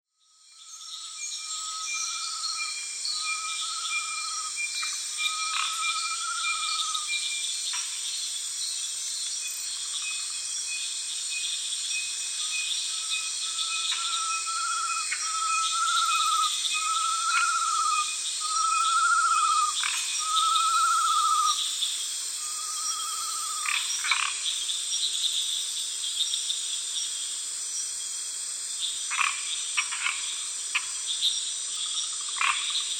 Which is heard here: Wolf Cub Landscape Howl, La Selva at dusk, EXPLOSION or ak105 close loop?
La Selva at dusk